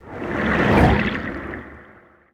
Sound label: flamewhoosh slow; Smite; Sol